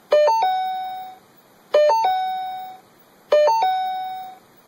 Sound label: ford mustang chime